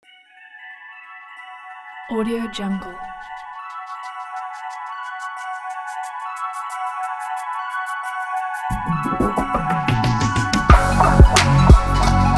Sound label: space chill